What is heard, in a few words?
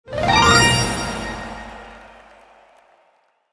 Correct answer game show tv; film and tv; gfxsounds